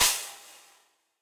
Crash FX Deep Snare Dynamics, Cymbal; Drums; Drum Hits; Standard Hits; Ableton; Factory Packs; Session Drums Club

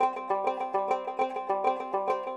Indian Do Tara C, Dotara Guitar; World

